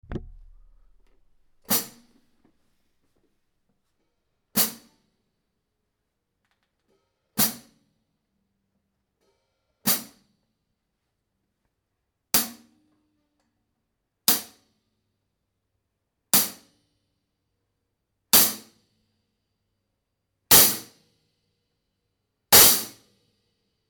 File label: Hi hat Extra Heavy 15; Amedia; Classic